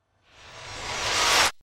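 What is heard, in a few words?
Trailer Hit Reverse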